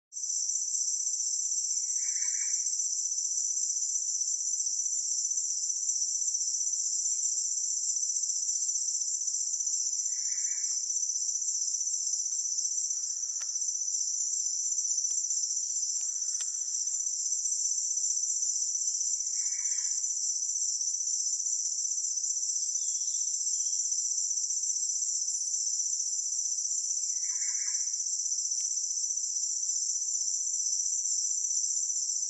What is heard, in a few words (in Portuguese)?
Canto do rapazinho de bone vermelho